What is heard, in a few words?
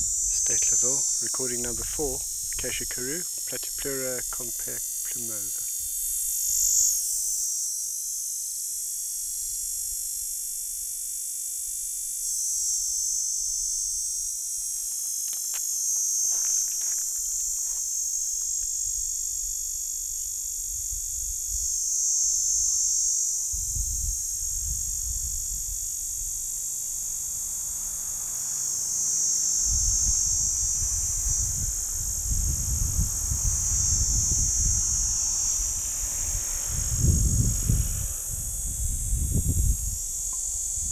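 Platypleura plumosa Steydtlerville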